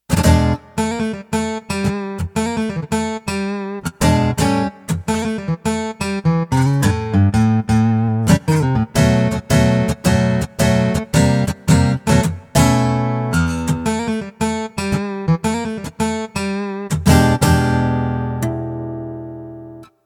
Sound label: SuperNATURAL Akustik Strum Steel Guitar